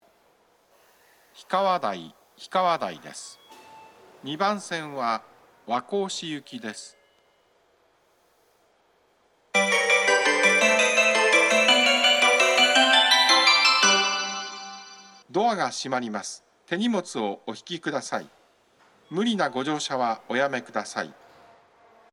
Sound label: melody; hikawadai